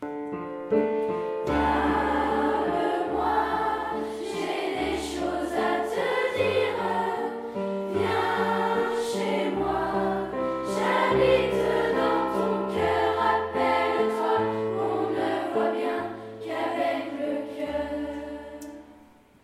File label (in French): chorale